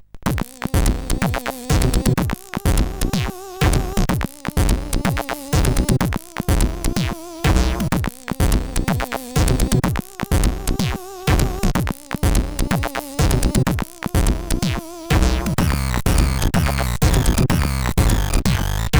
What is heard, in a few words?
Industrial, sid CSG8580R5; Astovel